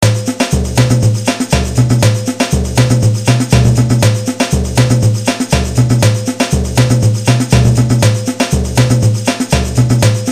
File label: samba reggae Ska groove